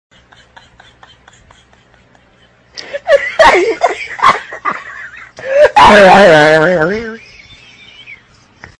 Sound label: Laughing; at Dog With Big Teeth